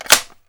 g3 safety, weapons; garrysmod

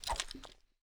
melee slash, weapons; garrysmod

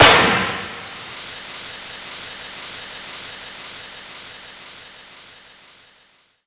explode, weapons, explosive m18 smoke; garrysmod

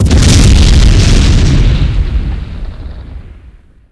big explosion, weapons, bomb; jihad bomb; garrysmod

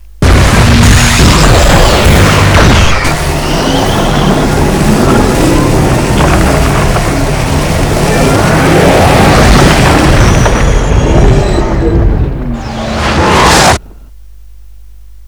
ready; cstrike; ion

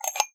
supressor on, weapons; garrysmod

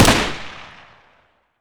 sg550 fire; garrysmod

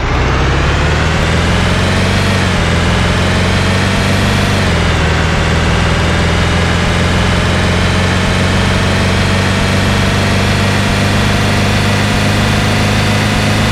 1st gear, truck; vehicles; garrysmod

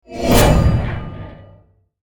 shield on; cstrike; war3source; avernus

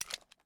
glock20 mag out; garrysmod

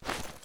pp1901 cloth; garrysmod

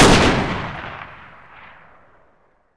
rem870 fire, shotgun; weapons; garrysmod

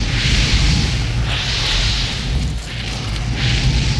blizzard loop; cstrike; war3source; archmage

